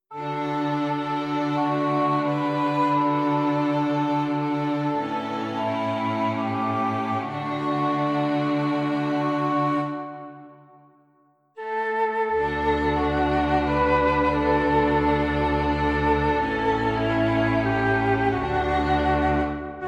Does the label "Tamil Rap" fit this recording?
No